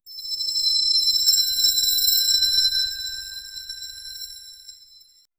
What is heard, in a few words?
chilly echoing reverb acr